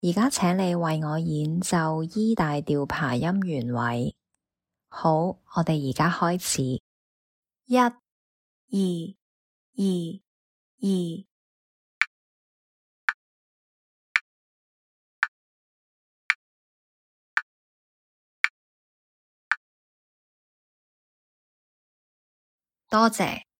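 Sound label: E major arpeggio in root position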